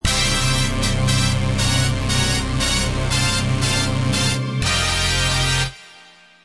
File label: Battle Clear; Crash: Mind Over Mutant